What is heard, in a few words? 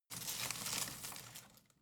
home plant leaves rustle regular